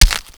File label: veg hit